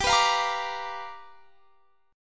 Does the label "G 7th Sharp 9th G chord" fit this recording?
Yes